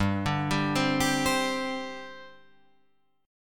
G Suspended 4th, Guitar, Drop D

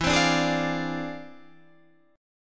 E 7th Flat 9th Sharp 5th; E7b9#5; strummed

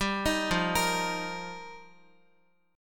F 7th Suspended 2nd Suspended 4th, Guitar; F7sus2sus4; arpeggiated; x,x,7,8,5,6

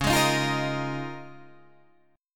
Db 6th Add 9th Db, down, Guitar Open D

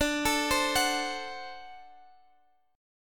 D 7th D down Guitar Standard; D7 arpeggiated; capo=3

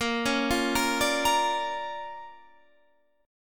D Minor Major 7th Sharp 5th A sharp, guitar

